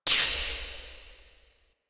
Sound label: Corruption Pickup Despawn; Warframe; Missions; Zariman